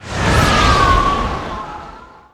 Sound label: Magic Spell Tornado